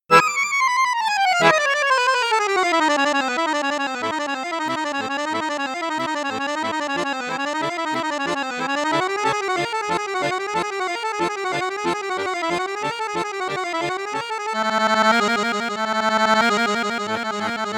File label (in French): registre flute boite piccolo, accordeon